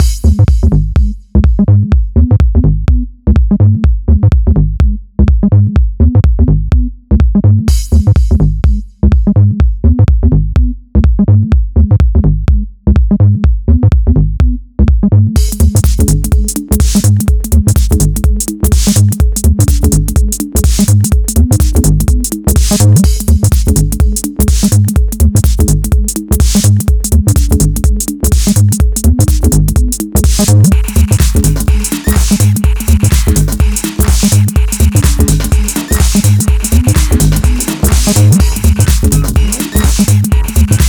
Electro-Pop

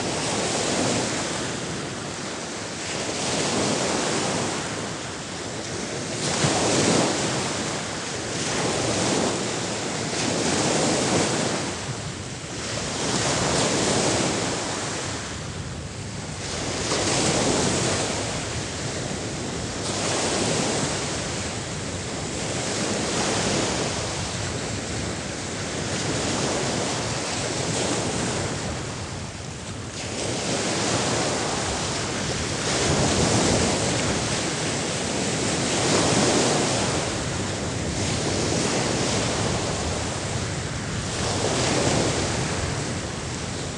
Rain Sounds Rain Nature Sounds - High Ocean Waves; Sleep Therapy

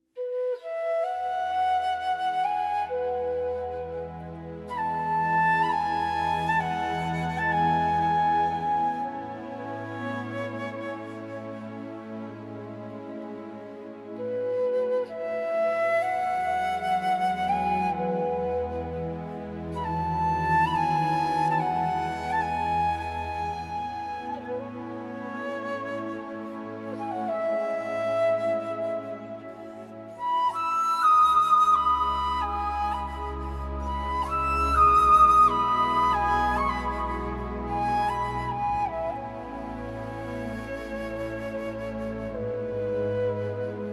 Flute; Genshin Impact; Sumeru OST; Sumeru City

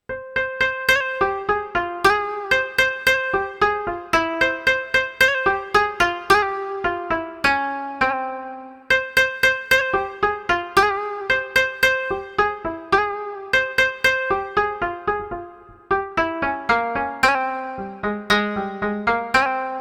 Chinese Music Ensemble, Tea Picking Dance

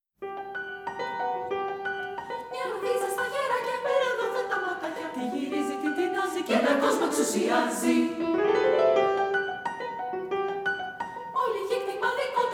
Female Choir

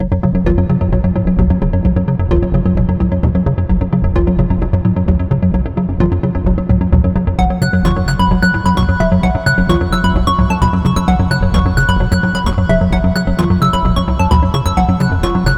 Jazzy Beat

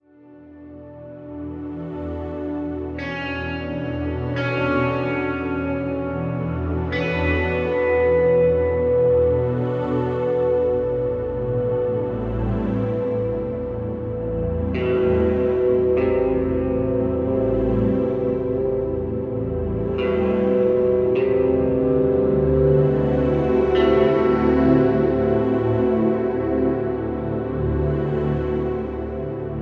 Instrumental Ambience

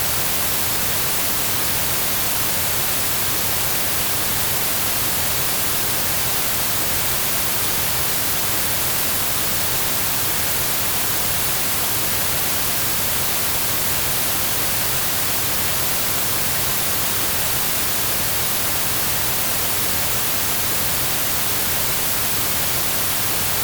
Static Sound; Static Sound for Focus

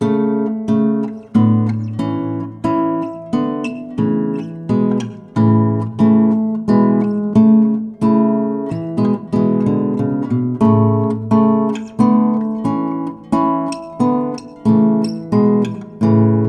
Instrumental Guitarist, Ode to Joy

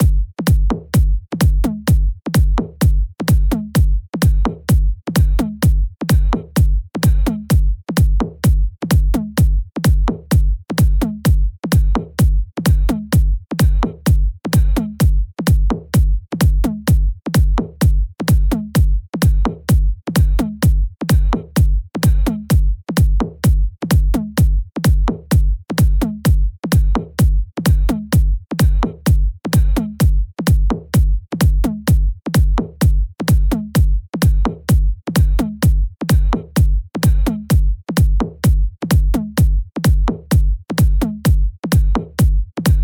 Percussion Loop 128 Bpm

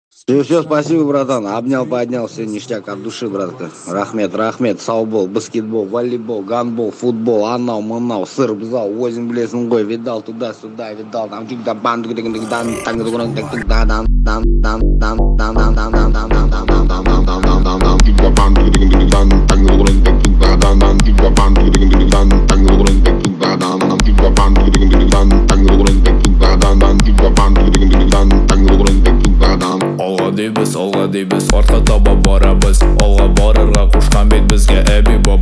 41-46hz Low Bass

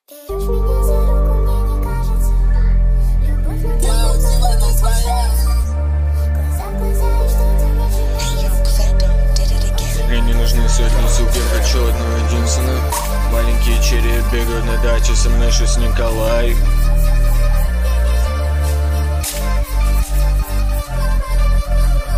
Sad Drill

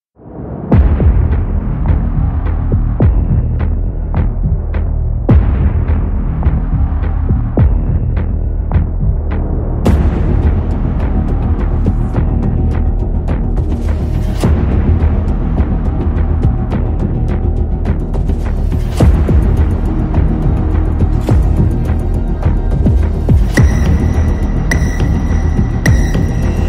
Instrumental

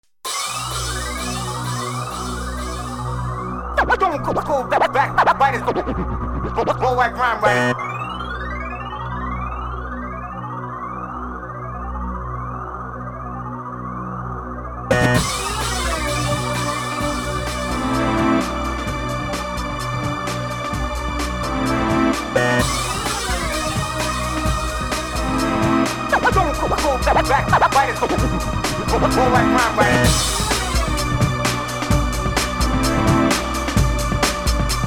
AIR GUITAR

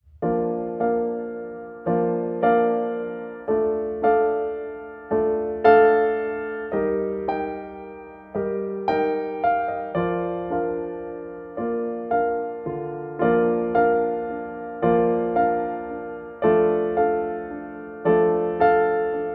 Piano Tune

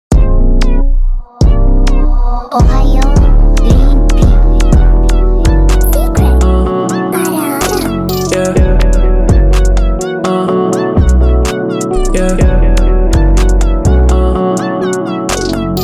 New Jazz

